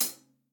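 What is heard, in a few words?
Hi-hat Paiste 302 14 F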